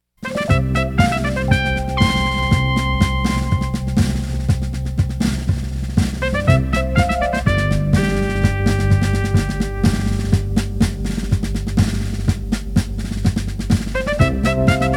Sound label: Fife & Drums